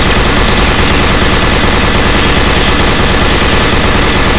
tankmini shoot crit; tf2classic

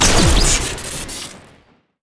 laser blaster shoot crit; tf2classic

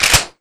grenade launcher cock forward; tf2classic

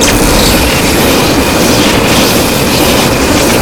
fire hose loop crit; tf2classic

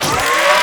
graphite wind up; tf2classic

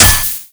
ubersaw hit, weapons; tf2classic

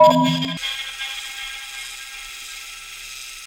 Scan, weapons; LucyCharm; tf2classic

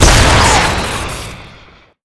burster shoot crit, weapons; tf2classic

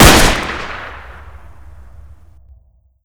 revolver alt shoot; weapons; engineer; tf2classic

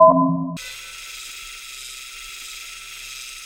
Scan; weapons; buildings; LucyCharm; tf2classic

